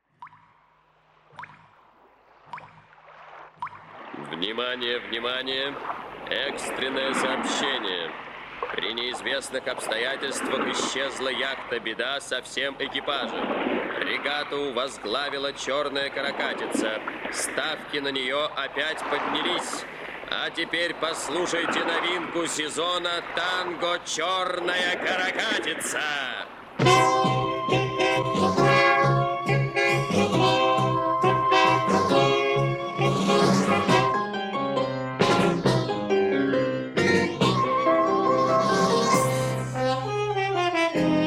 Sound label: Tango